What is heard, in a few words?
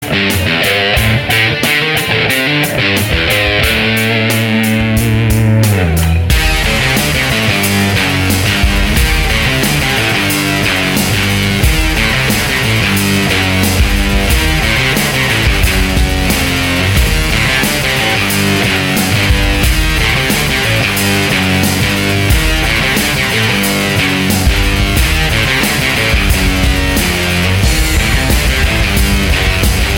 Machine heavy rock; MIUI12